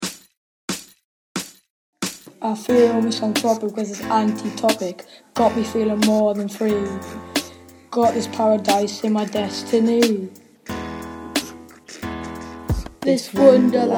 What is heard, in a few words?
Rap